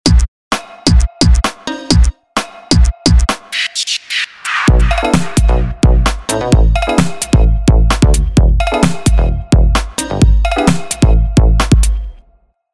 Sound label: DJ scratch; TCL; 10 SE; ringtones